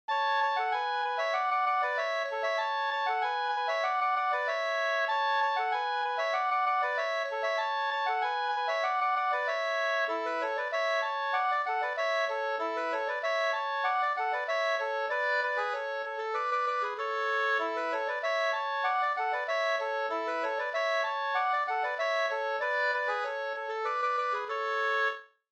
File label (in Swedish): halling, 2st